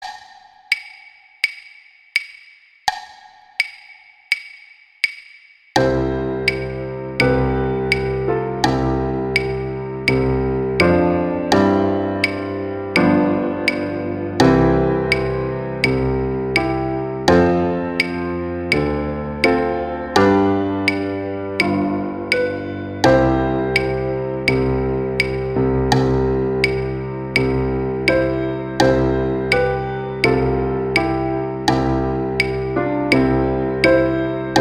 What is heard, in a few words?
Joy to the World; Christmas